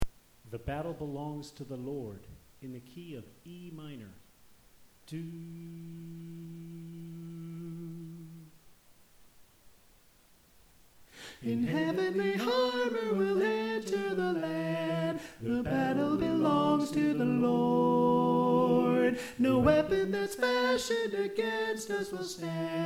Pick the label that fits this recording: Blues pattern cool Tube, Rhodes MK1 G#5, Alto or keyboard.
Alto